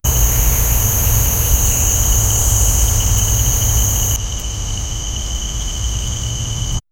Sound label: soft sounds of a nighttim, swamp